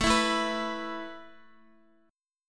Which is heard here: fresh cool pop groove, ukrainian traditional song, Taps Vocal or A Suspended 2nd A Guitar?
A Suspended 2nd A Guitar